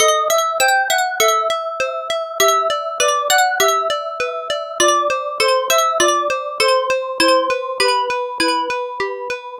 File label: Clear All; Monkey King Master of the Clouds